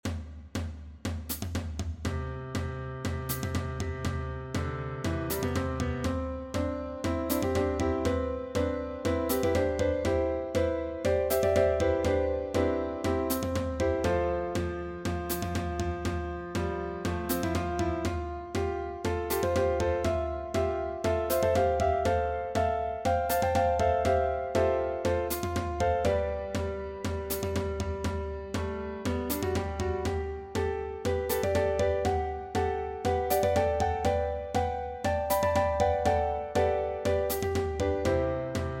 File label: one tonality